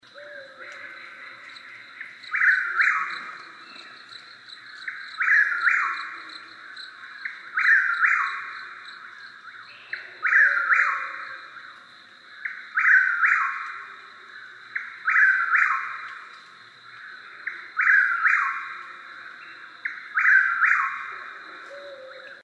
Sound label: Ozark Dawn; ozark mountains